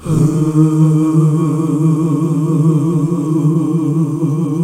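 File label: Gospel; Best Service Gigapack 1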